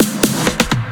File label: Restart; Isanybodyoutthere Restart; Megaton Rainfall; PS4